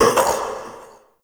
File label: Mockery Male Vocal Pain; Characters; Enemy; Vocals; Mockeries